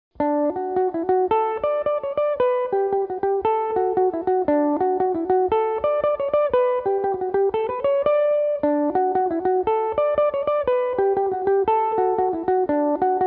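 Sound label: jig d major, mandolin; Philip Mathers' Jig; Belmuse EM-190 electric mandolin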